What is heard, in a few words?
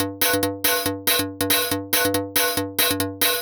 Hi-Hat Loop, Drums, Dance Beats